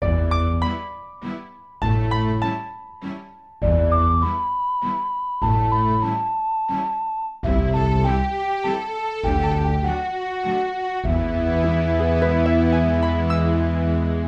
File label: Minuet Of Woods; OoT